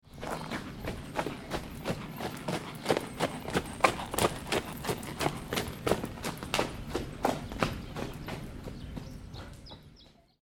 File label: Horse Trotting By, Single horse trotting by on dry gravel. Horse hooves trot; Horse Sound Effects; Animals; LynneMusic